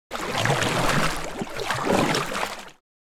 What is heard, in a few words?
Canoe paddle movement; boat